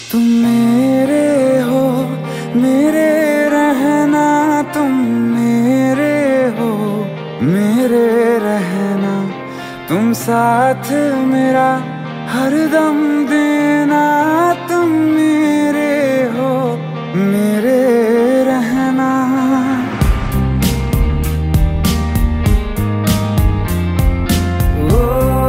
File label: Romantic Song Ringtone